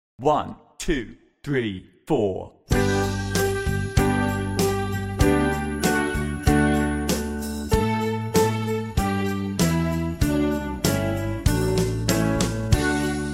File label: Jingle Bells Slow